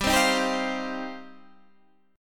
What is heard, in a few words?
G 7th Suspended 4th Sharp 5th G down